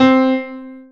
Grand Piano

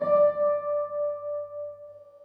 Sweet Piano

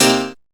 House Piano

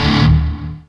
Hard Bass

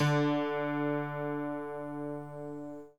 Bottom Piano

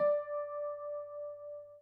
Sweet Piano

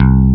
Cool Bass

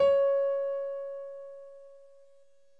Piano c6

